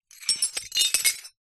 plate dish ceramic broken pieces set down on ground